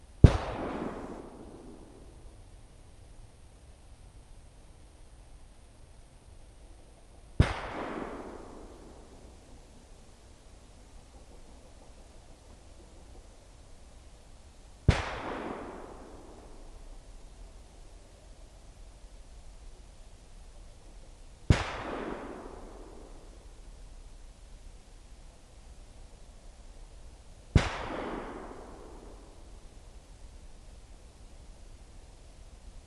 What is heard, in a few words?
ak5 valley field forest 50m behind gun off axis behind buildings; fvtt sonniss gdc audio